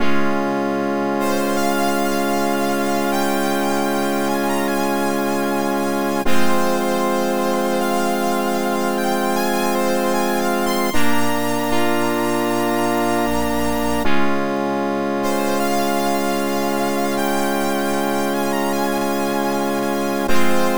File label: Strings; super star wars pc; ibm pc gamerip 1995